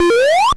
Sonic Jump; Scratch

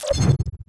portal enter; Scratch

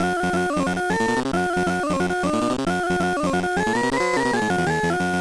pacman song; Scratch

